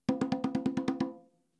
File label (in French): frise, congas